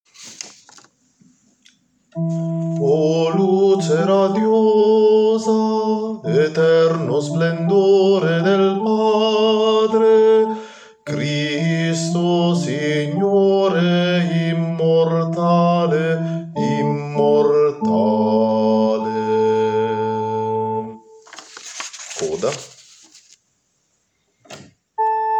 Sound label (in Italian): Bassi; O luce